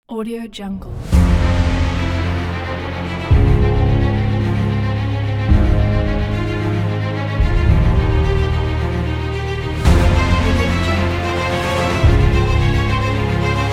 epic hero ident

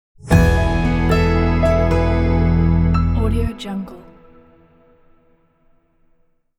modern bright positive logo; mylesproductions

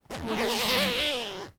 zipper

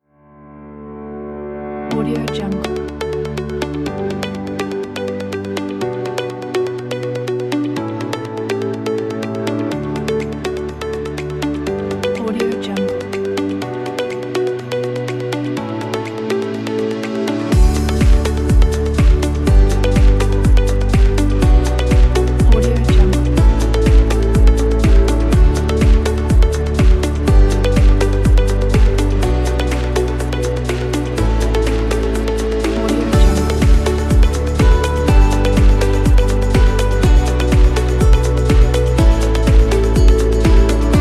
corporate inspiring successful; sergeyspb

